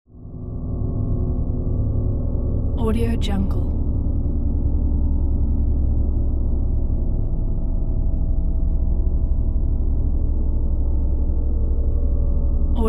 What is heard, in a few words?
eerie space ambience